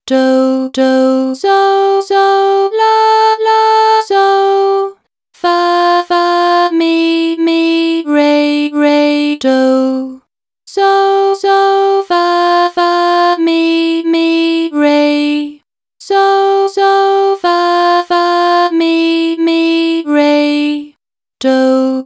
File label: twinkle, solfege; Twinkle twinkle little star